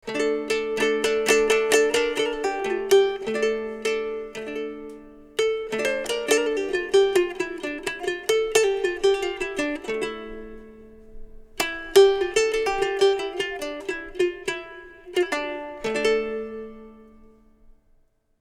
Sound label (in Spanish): citola